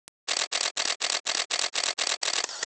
Shutter multi, Cam; LG GD880 Mini

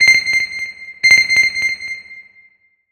Alarm Synth; HTC Touch Diamond

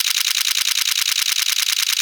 camera continuous shooting; Kyocera Cadence LTE

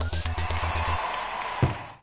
Football off; Siemens; C72

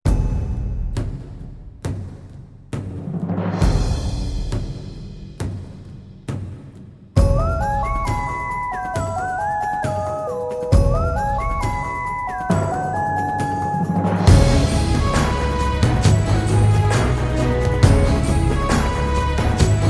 Signature Tune; MTS India

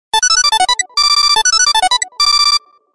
A cricket chirps; Samsung SGH-T199

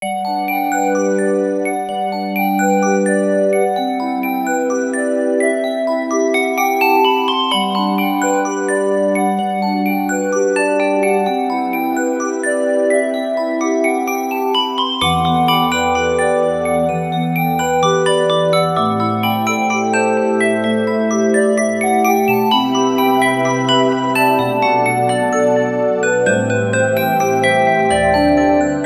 AlarmB; Sony Ericsson; Tyra (G900); PlusFourSix; Lars Edvall; TyraFeel

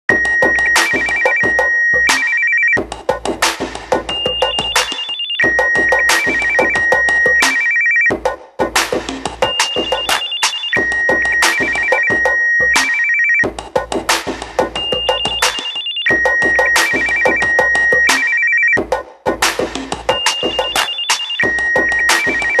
Ring Slow Answer; HTC Ozone

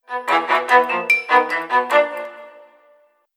Dramatic Strings, notifications; Motorola Bravo

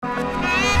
Hiphop instant message

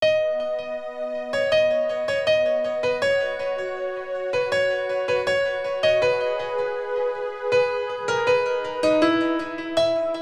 Slumberness Alarm; PlusFourSix; Lars Edvall

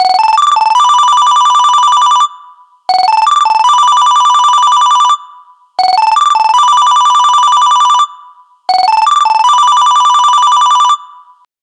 Simple Beep, ringtones

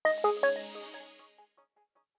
shutdown; KE770 Shine

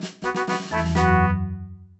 game chess checkmate, Nokia 770 Internet Tablet

